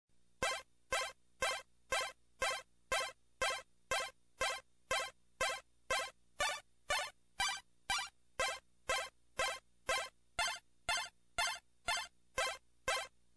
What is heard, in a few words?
chords, steel